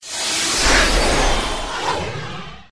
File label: 3G Power On; Pantech P2020-Ease